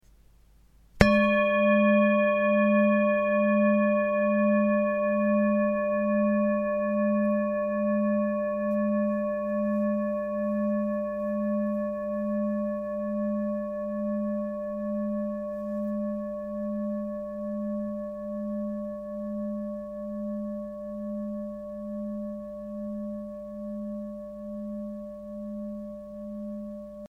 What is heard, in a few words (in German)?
Klangschale